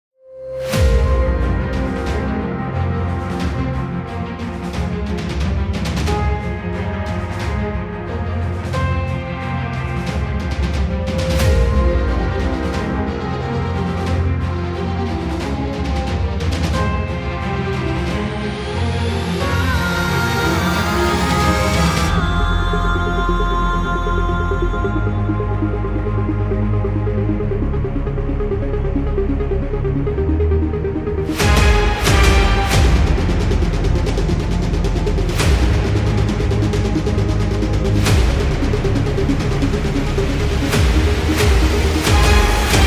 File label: Epic Music instrumental